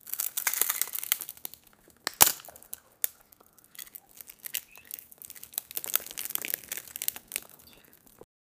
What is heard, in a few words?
kitchen